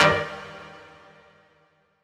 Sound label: Dance Mega Synth; Best Service; 90 s Sample CDs